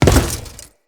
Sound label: Step Default, Footsteps; Grineer